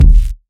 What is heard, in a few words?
Kick